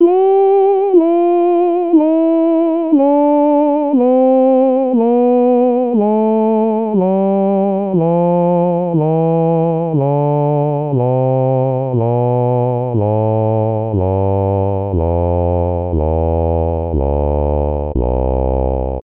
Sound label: Bass Voice